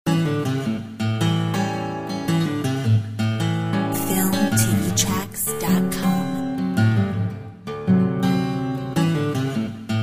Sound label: Blues